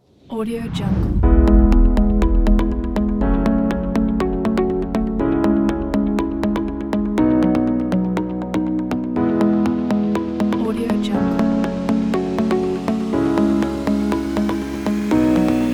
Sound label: the light corporate upbeat